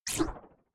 Ui Compliment Text

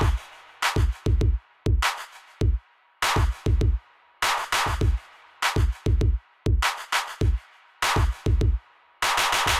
Music Tension; nova 111; ps vita

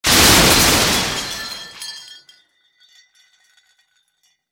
Crashing Sound

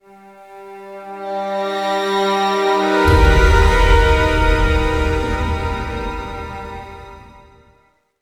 Stinger; Rage; Rednoteaudio; Ghost Hideout; Killroom Hero

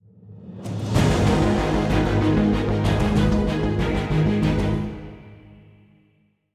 190bpm; Legends of Pegasus; windows gamerip 2012

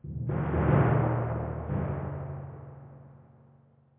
Tymp

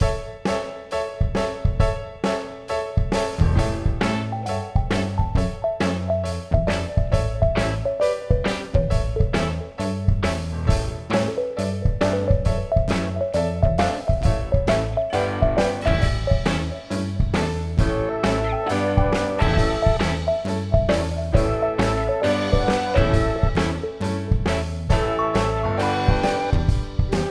Social Hub Ambient Low; Plants vs. Zombies Battle for Neighborville

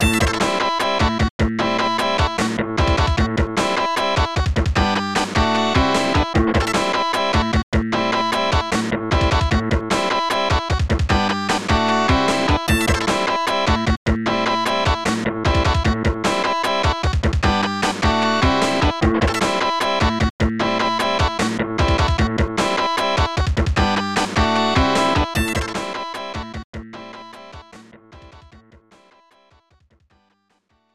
Sub Menu; Hokuto no Ken 7 Seiken Retsuden Denshosha e no Michi

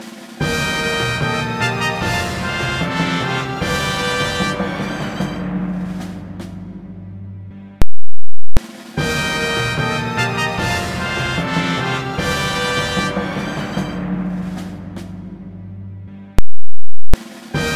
Cup Win; super mario strikers gamecube; Star Cup

